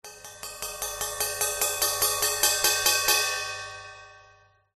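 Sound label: musical cymbal crash tap light to hard crescendo; zapsplat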